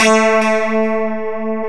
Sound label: FM BASS; AKAI S-Series CD-ROM Sound Library VOL-7